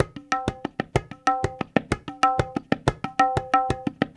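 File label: 93 bpm, tabla; Tabla Grvs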